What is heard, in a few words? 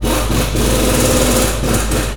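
Impact big, air tools, industrial; Air toolks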